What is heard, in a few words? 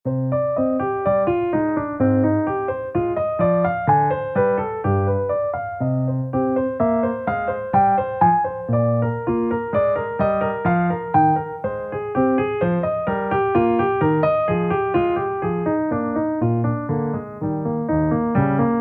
Prelude in C Minor, piano; BWV 934; Bach; piezas faciles piano